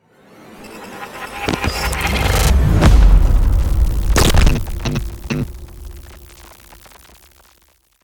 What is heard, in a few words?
Glitch transition whoosh cinematic long